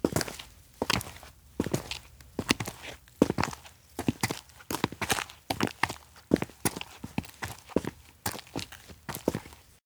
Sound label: steps outdoor observatory walk; LOOP; kigurumi; awayuki; anemoi